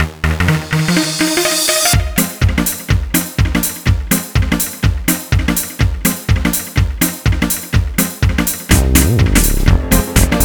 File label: Goal